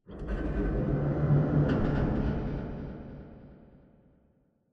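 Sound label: Robot Arm Tilt Up Distant; Boomtown; Titanfall 2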